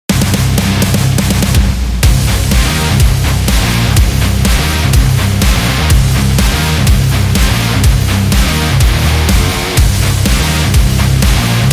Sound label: The Heavy Metal; sports training energy